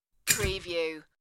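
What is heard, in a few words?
Mixer Lid Close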